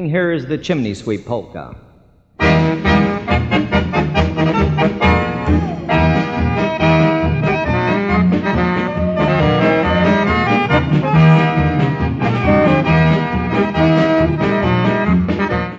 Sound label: polka